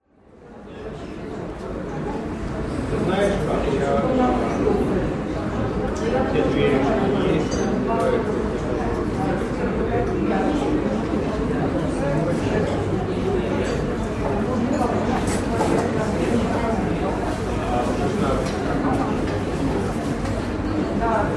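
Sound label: sounds of feast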